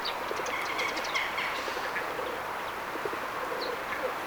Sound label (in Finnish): arvatenkin pikkuvarpunen ja viherpeippo taustalla kesykyyhkyn soidinta naakka